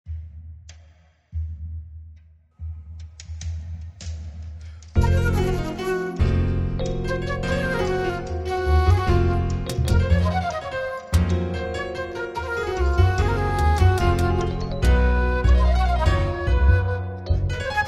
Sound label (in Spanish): Solea solo segundo, flamenco flute